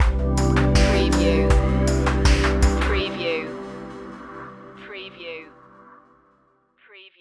abyss stinger

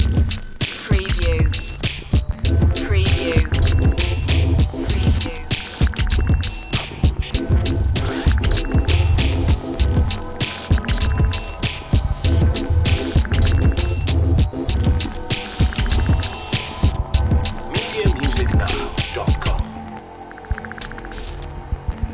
grinding loop

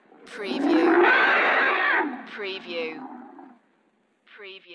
Dinosaur Screech

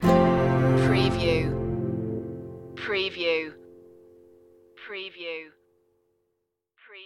the nu-folk tune stinger

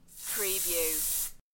Aerosol Spray

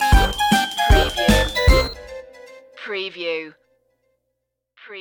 retro video game chicken run stinger

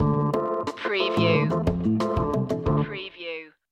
cool cop stinger

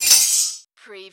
Button Sound - Metal Grill Drag

